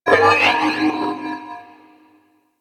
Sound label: Robot Metallic Textures, Ambience; Warframe; Venus Open World; Objective Rooms